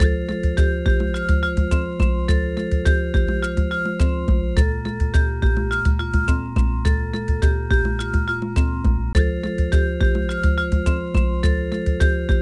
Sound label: Music Mini Games